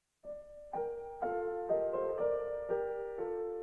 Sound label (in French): huit notes